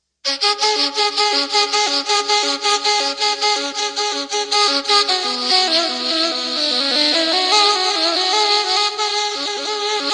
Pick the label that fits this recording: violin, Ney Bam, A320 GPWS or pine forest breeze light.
Ney Bam